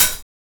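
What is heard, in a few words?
hi hat; E-Lab; X Static Goldmine 1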